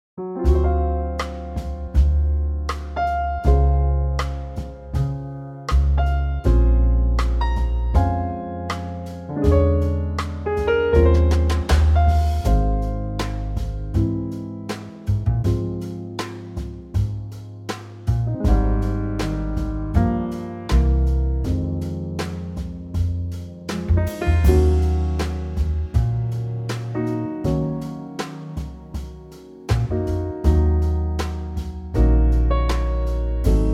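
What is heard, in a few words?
Ab trio, Backing Tracks for Male Vocals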